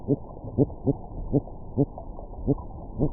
Serrasalmus spp sanchezi odyssei complex, Amazon Fishes, soniferous